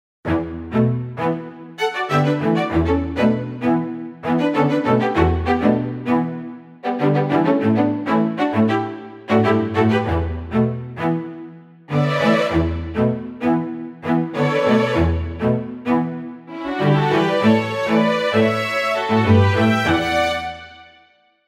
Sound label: mir pro 3d mir on pop